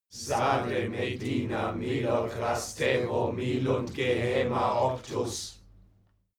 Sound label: male crowd evoke